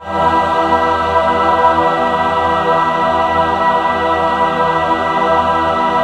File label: BIG MIN E4 L, BIG CHORD, Symphony of Voices